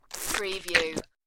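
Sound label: Throat Slit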